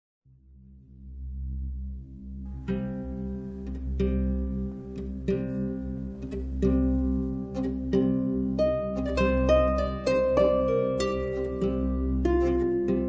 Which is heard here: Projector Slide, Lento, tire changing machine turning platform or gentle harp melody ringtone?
Lento